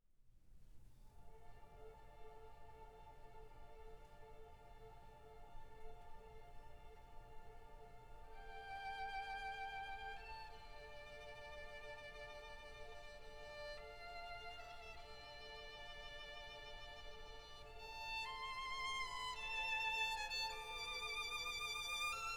Violin Concerto in D minor, Allegro moderato

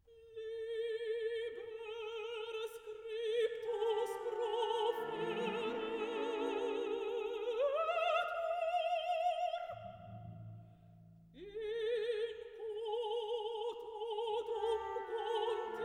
Dies irae. Liber scriptus (Mezzo-Soprano, Chorus); Giuseppe Verdi; Verdi Requiem

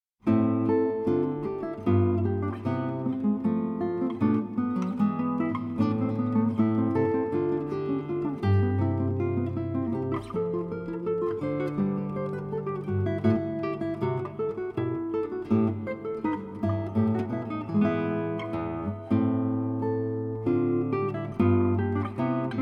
Guitar Suite in A Minor, BWV 995 Gavotta; Johann Sebastian Bach

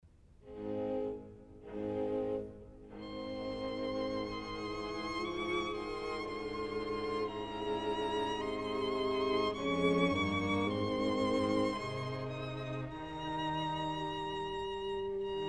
String Quartet in E flat

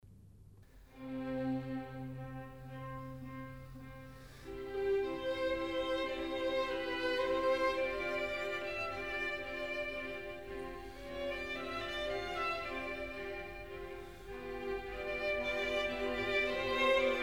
Quartet in G, String Quartet; Haydn; Op. 54

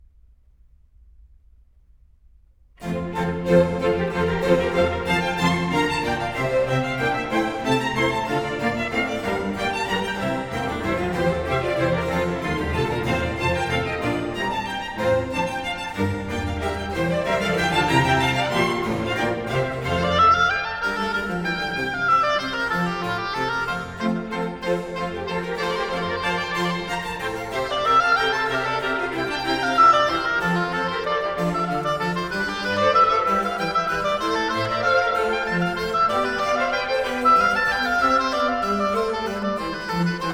Oboe Concerto in F Major; J.S. Bach

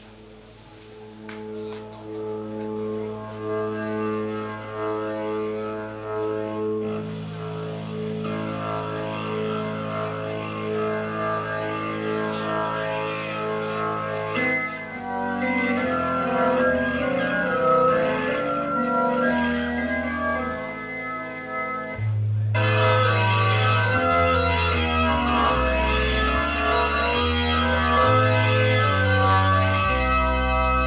Organ Solo

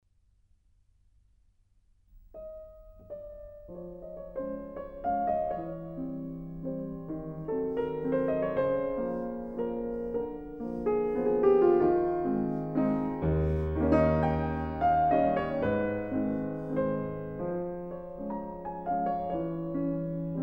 Waltz No. 9 in A flat; Chopin

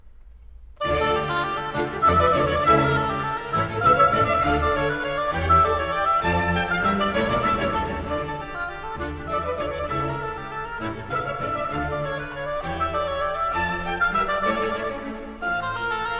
Oboe Concerto in D Minor; J.S. Bach

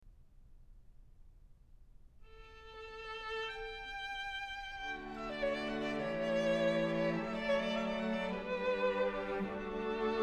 String Quartet No. 3 in D Major, Allegro; Ludwig van Beethoven; Beethoven - String Quartets No. 1-6, Op. 18

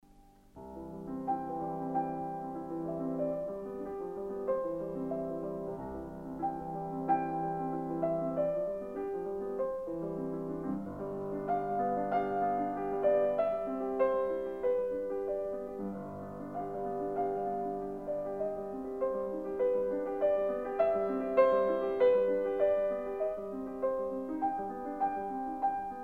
Piano Sonata No. 21 in C major; Wilhelm Kempff; Beethoven; Piano Sonatas

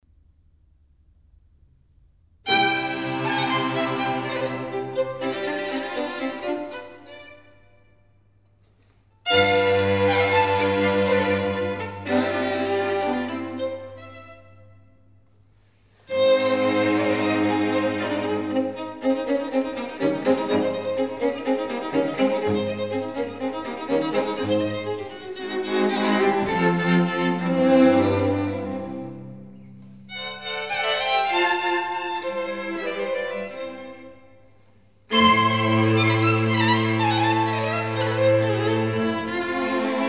Quartet in C, String Quartet; Haydn; Op. 54

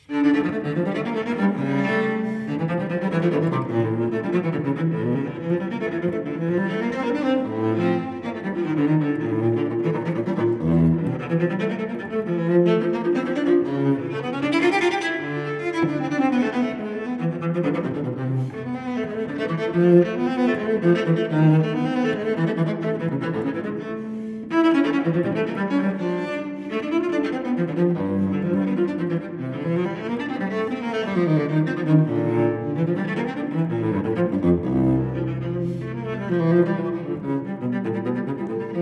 Cello Suite No. 2 in D minor Courante; BWV1008; Johann Sebastian Bach

